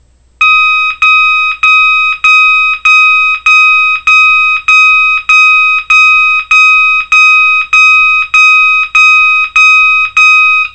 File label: MASSADA 1200hz